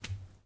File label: hands on a wall; Apotheorasis